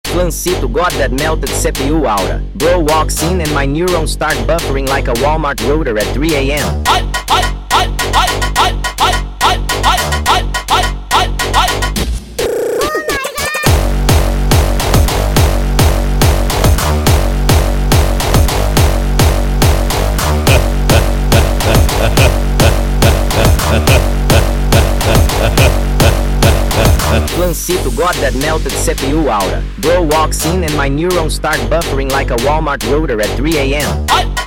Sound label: Hardstyle super Sped Up